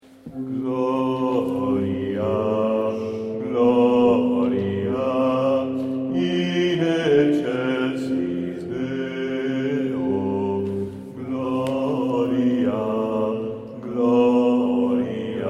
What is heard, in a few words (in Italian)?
Gloria Bas Voc; Visita Arcivescovo